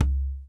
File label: WD L, DRM HITS; Spectrasonics; Liquid Grooves; WAVDRM HITS1